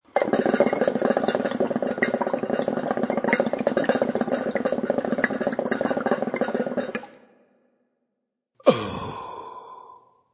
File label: Hookah Exhale; Novelty Ringtone